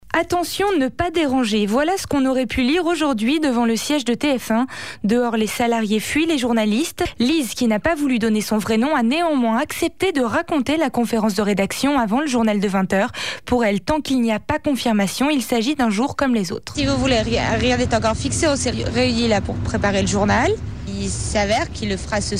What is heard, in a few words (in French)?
Drole d ambiance devant le siege de la chaine; a Boulogne Billancourt